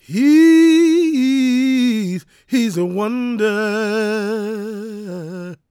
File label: GOSP MALE, Vocal Gospel; Vocal Planet Vol1 Gospel